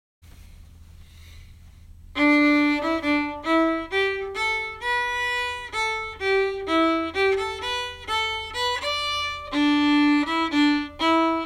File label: slow